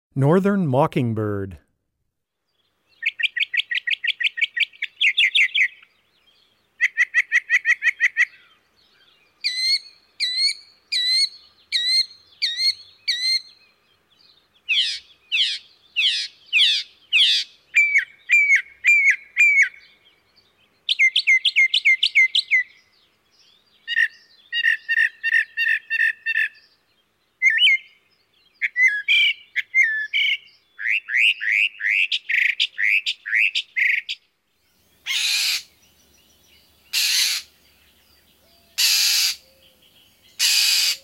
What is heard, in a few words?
Mockingbird, Wildlife Sounds